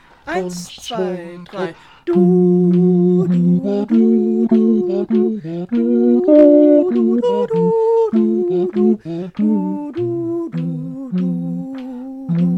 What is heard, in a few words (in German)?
ohne SOP Swinging